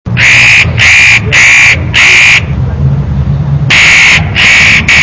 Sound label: nucifraga caryocatactes